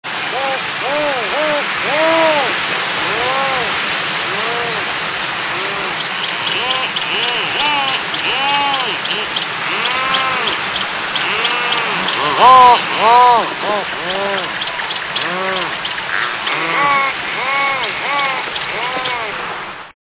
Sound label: Rhinoceros Auklet